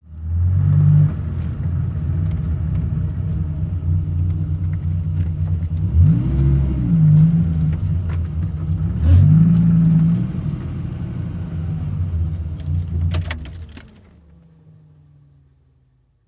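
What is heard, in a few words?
Accelerate Forward Gear, sports car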